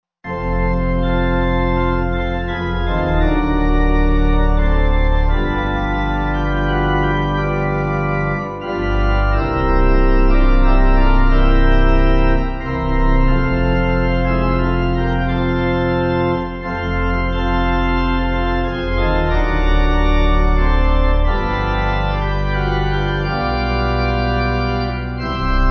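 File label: Pipe, Pipe Organ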